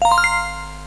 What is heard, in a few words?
stop; slotsfruitex